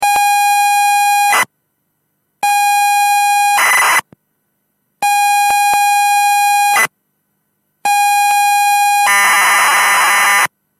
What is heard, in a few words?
Sinclair Spectrum ringtone